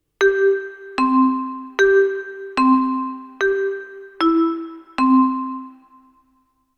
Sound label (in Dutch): viertoonladder